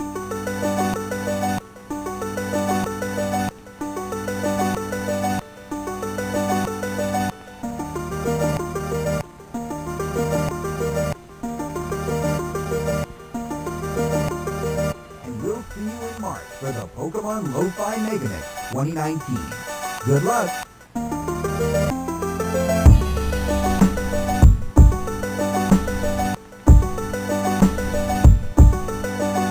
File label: Lo-Fi Remix; Pokemon Lo-Fi Megamix 2019; Sinnoh Lake Theme; DPPt; Trio